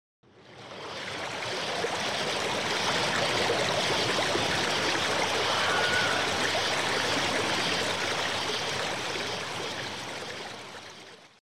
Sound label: scream in the distance; NES practice tunes